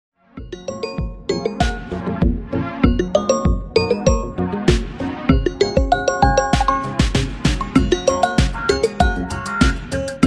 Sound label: Vivo Ringtone